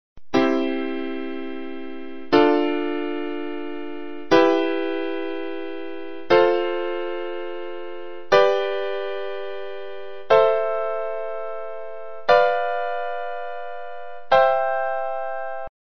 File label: C major chords